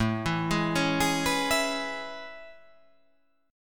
A Major 9th A

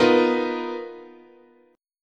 B Major 7th Flat 5th, chord, guitar

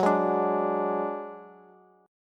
Gb Suspended 2nd Sharp 5th, Bass; strummed

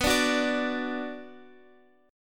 B Minor, Guitar, Standard; strummed; 19,17,16,19,x,x; down; capo=9

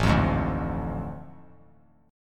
A Major 7th Suspended 4th Sharp 5th; AM7sus4#5; strummed; JGuitar

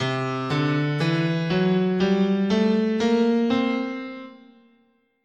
C Lydian Dominant, scale

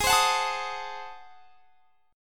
Ab 7th Flat 9th, chord; x,23,22,23,22,23; strummed

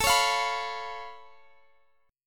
C sharp Augmented 7th A, chord

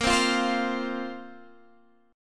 F Suspended 4th Bb

